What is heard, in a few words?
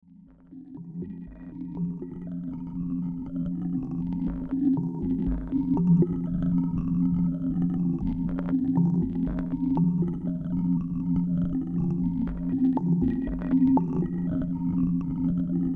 Arp Texture; Beds